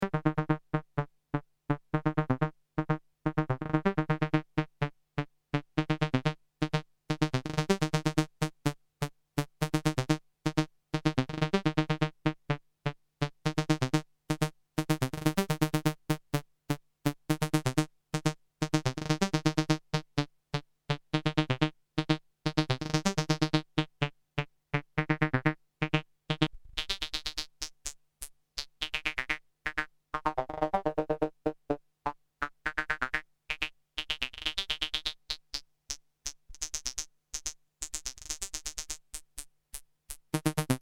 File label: polivoks acid